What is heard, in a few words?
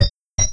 load iron